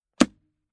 Transport Car Classic Bentley Eight Headset Arm Rest Compartment Latched Closed; Car Sound Effects